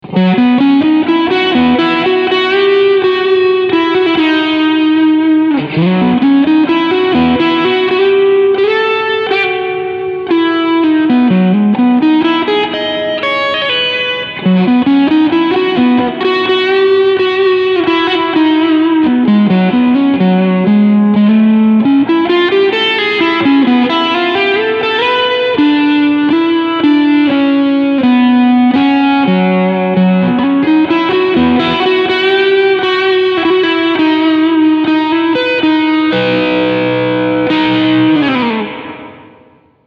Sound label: Surfybear Reverb Overdrive